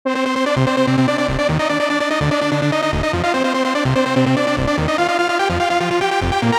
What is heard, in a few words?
Lead, eurodance 90s; Fancy-Lead